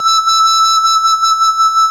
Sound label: ORG VOX E; Roland LCDP09 Keys of the 60s and 70s 1